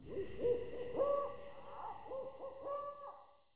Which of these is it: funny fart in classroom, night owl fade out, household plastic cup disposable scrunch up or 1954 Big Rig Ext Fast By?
night owl fade out